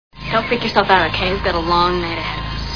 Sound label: scream